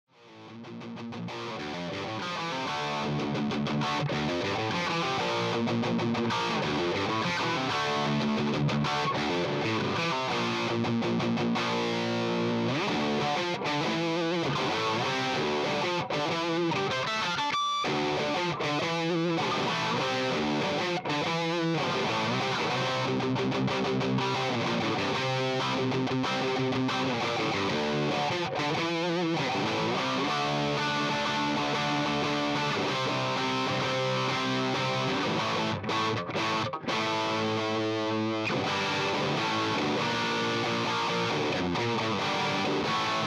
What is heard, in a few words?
Normal ja bright input jumper input humbucker